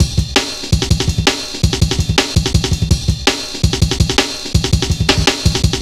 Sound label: Drum Bass, Drumloops, 165bpm; Zero-G; Total Drum Bass